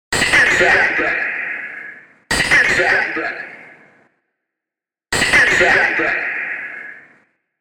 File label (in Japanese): ディレイ